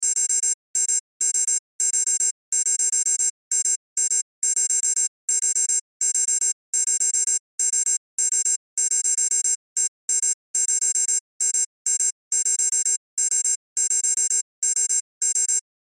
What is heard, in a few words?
Sci Fi Type Beep Cursor Loop; Control Panel; Blastwave